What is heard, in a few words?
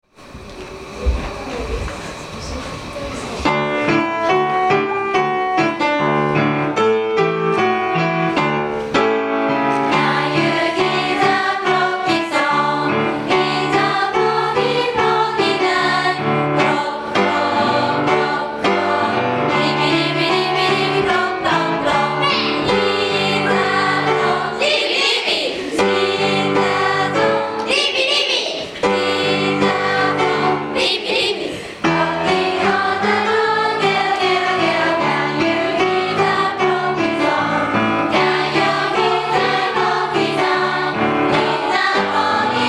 frog song live